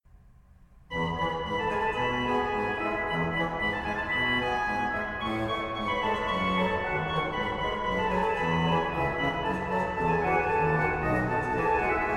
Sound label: Piece in F major for Keyboard